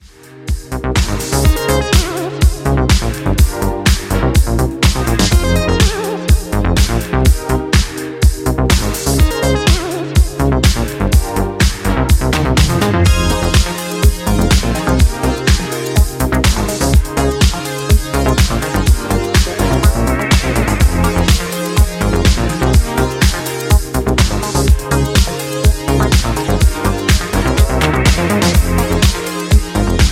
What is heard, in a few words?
instrumental